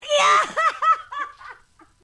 eyeheheheh

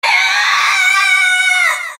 cynical scream; soul calibur v

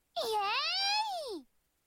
yay

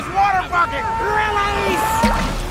water bucket release

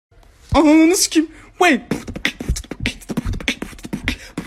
goofy skibidi beatbox

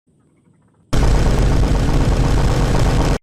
tommy gun sounds

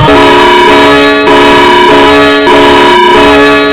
eas alarm; uzleodia tanzikape

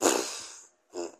fart and diarrhea; among us

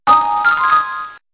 yellow switch; mario vs donkey kong

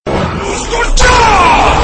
fus ro dah, only the cry; so o grito

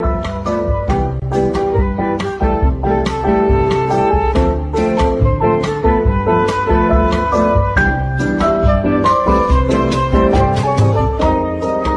under construction music

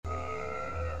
burping sounds

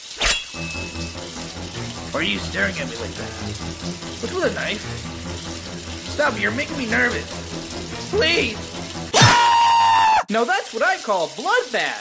please aaaaaaah

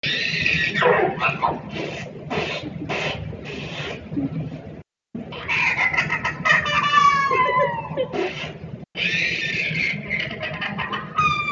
burp

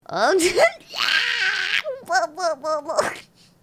troll noises

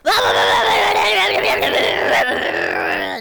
possessed

